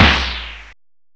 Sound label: SNARE, DRUMS